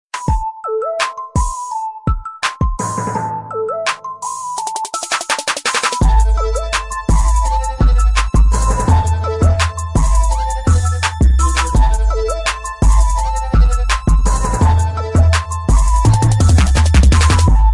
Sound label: hip hop beat